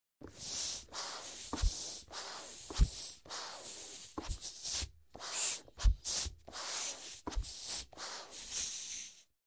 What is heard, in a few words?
Biking Large Bike